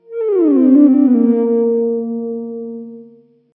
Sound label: Glissando; Hylics